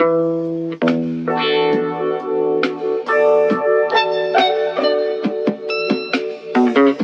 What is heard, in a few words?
guitar hydrated funk; Loops; Phonky